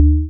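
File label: Panel Shield On; Among Us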